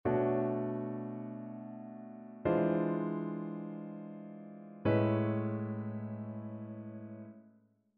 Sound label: ii V I progressions; ear training